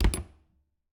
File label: Refridgerator, old fashioned, wooden, steel, brass clasps, hinges, close; Eiravaein Works; Vaeyan IV